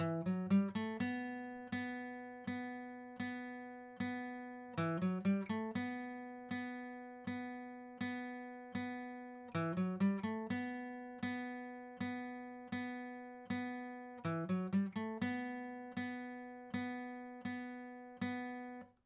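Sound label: acoustic pattern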